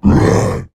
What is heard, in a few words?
attack, male zombie; nmrih; zerosiki; slender